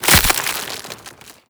zombie move loud, foley; creatures; Half Life Model Pack

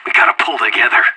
scared emote; anarchist

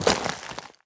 step light, footsteps player debris

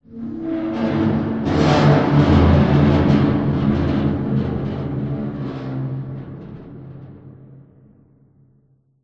amb apocalypse wronk, ambient city

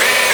dog servo; Half Life 2

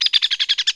squeek, forest; ambient; Half Life 2; Episode 2

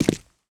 concrete, footsteps

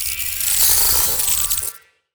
ui credits names in; Half Life Alyx

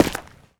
dirt, footsteps

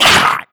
attack grunt, headcrab, creatures; headcrab classic; vox

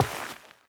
step light, footsteps, player, carpet

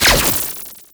orb bolt

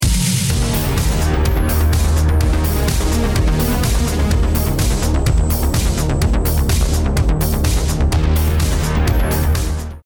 round ten sec count, music; hotlinemiami

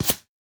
bullet by supersonic tail; weapons; nearmiss; Counter Strike Model Pack; CS2

